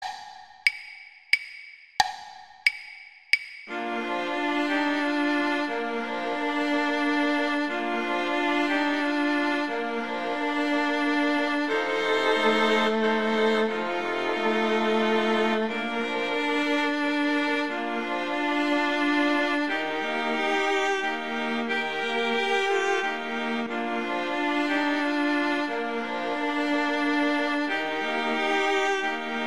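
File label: Silent Night Cello accompaniment; String; Gruber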